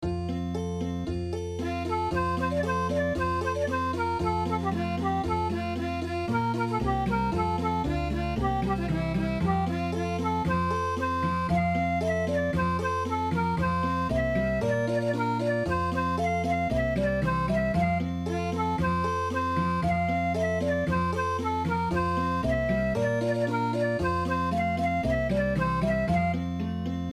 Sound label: Okinawa; Rasa Sajang e